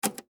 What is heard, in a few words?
Telephone, Button, Push, Line, Transfer, Office, Phone; BlastWave